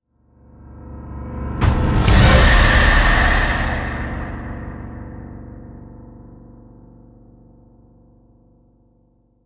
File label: Hit Hor Conjuring